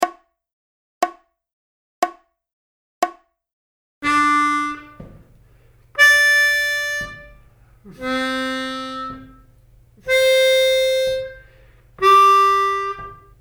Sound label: Easy V IV I Licks 60BPM, harmonica